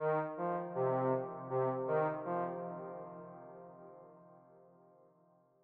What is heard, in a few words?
rythmic arpeggio; champ; arpèges; seeding-planets